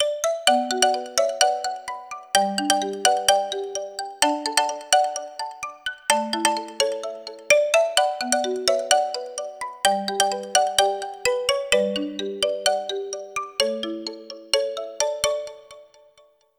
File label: Marimba, ringtones; Honor X9c; Go Beyond Marimba